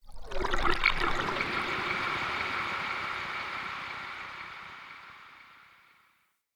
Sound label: trippy lap, ambience; Soul Plane